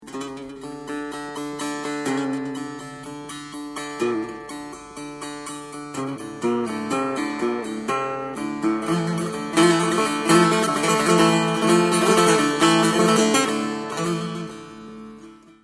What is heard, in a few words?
tar; Saz; Sonati